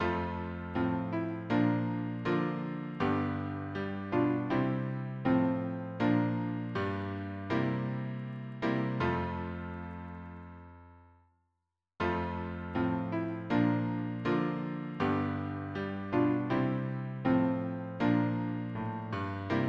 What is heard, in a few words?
harmony piano